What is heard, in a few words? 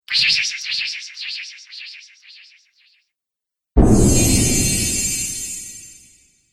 Magic Spells; Magic Sound Effects